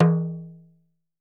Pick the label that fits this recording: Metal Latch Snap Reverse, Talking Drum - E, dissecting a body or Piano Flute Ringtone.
Talking Drum - E